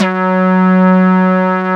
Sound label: PL MOOG; Wizoo - Powered Nord; STEREO LAYER